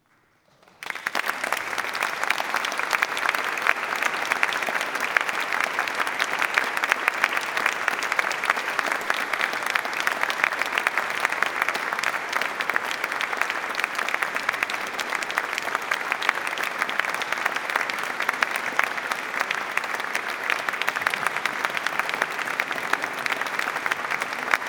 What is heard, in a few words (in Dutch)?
Applaus; Lochem; Geschenken